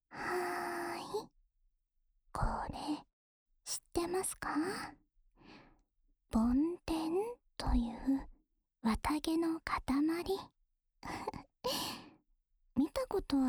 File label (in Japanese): 梵天耳かき（左耳）, ASMR